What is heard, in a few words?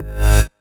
Synth Shot; Vengeance Samples